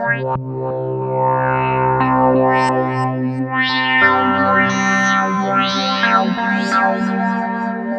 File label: Land Slide Synth; Sonic Foundry (Sony Creative Software); The Electronic Music Manuscript Collection